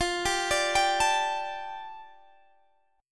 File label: D Suspended 4th F, guitar; Dsus4/F; arpeggiated